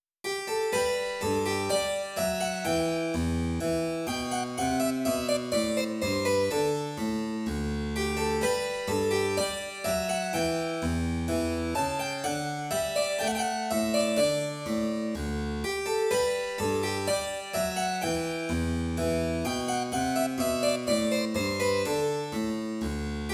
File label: Backing Track Harpsichord, Gavotte in G; Handel's Gavotte in G; Harpischord